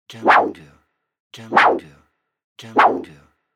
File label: Swooshes